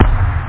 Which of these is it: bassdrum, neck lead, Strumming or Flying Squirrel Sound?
bassdrum